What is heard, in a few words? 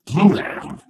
ballbot do it